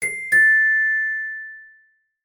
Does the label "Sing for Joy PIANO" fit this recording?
No